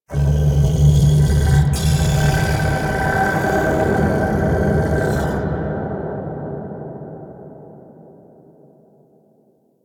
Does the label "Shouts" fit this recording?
Yes